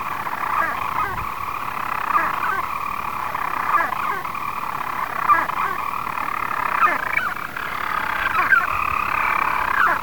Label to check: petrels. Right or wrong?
Right